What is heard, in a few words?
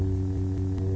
buzz; star wars; starsnodes